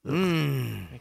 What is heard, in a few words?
Mmmmm